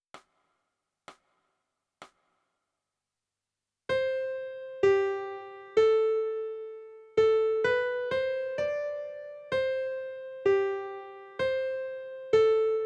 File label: Melody